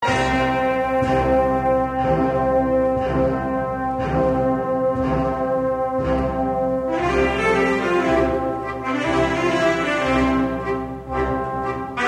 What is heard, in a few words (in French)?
Instrumental